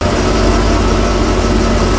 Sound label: Jeep Driving